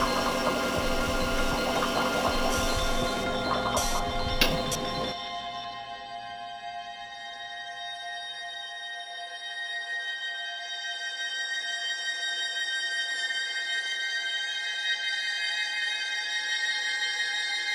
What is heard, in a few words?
Waterworks Murky Slow; rive wreck hack die retry; ps4